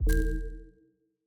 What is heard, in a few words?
You Loose